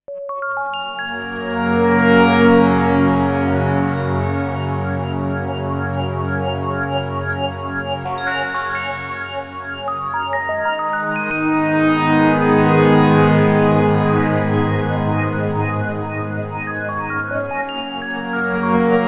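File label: Brass & Crystal, Yamaha SY77 pads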